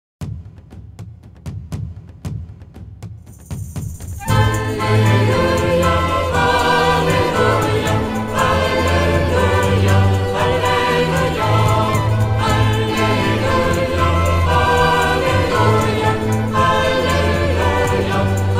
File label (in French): Alleluia messe; saint paul